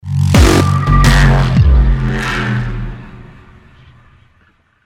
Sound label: deathcam, music; Counter-Strike Global Offensive